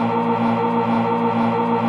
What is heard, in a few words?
umming, Voice